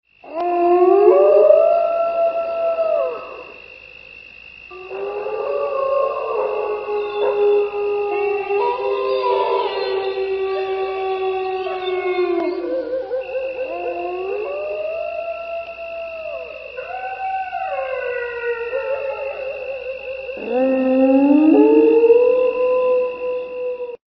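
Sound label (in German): woelfe, wolves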